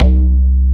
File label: TABLA C2